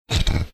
snorting

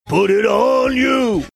singing

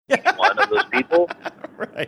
laughing saying right

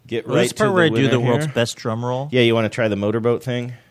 drumroll motorboat thing

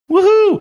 woohoo

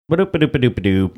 making thinking sounds

